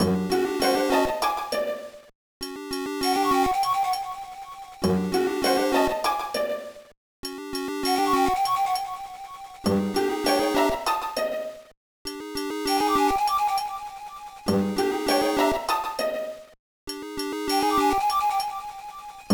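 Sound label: background music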